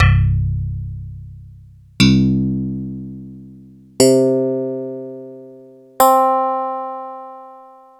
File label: SLAP BASS, Synths